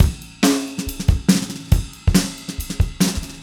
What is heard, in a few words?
Acoustic Kit, Drums & Percussion